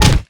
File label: sledge hit; melee weapons; tfa starwars melee weapons pack